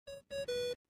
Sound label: ringtone; dodoco